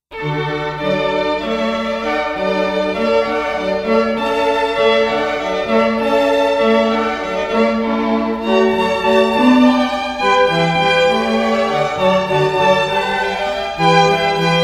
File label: water music